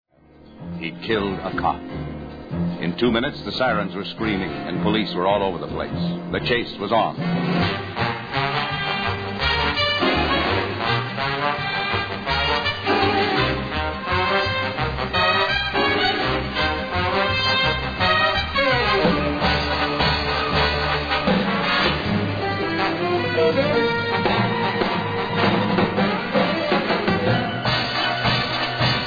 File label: Jazz Chase; The Naked City